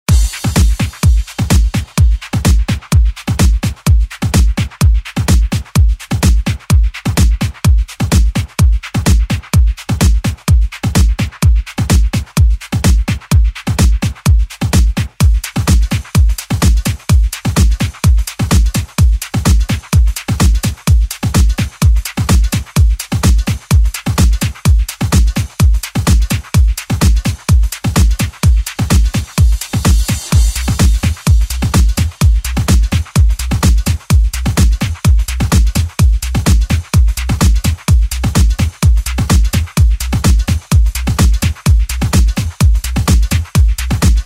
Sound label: Blow your whistle